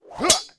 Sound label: guard attack; propack